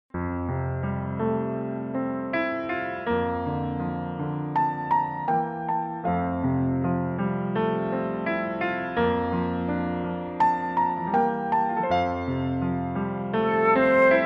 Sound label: piano play flute